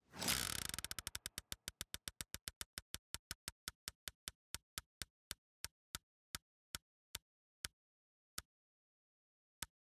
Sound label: case tick